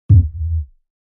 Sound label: LFE Electronic Thump with Low Tremolo Tail